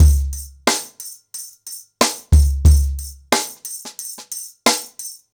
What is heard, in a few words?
Nuclear 90BPM, ACOUSTIC DRUMS; The Roots Drumloops